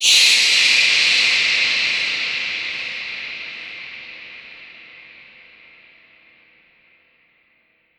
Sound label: Bats